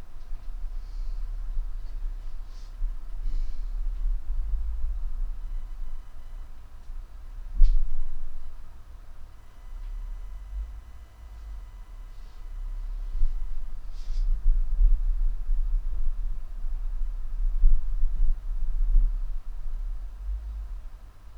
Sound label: stomping in morning; birds